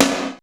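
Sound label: gated snare drum; East West; Global Sounds; HR16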